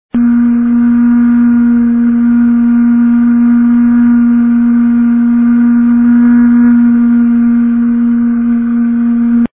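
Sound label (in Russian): флейта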